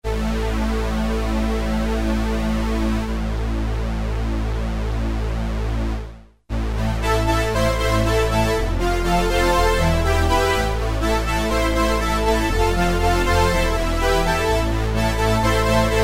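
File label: PWM Chorus, Roland Juno-60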